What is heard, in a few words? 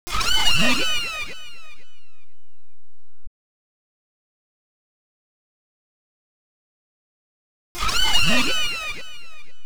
125 Bm Sfx, Electro Synths; Prime Loops; Complex Electro Synths; Focus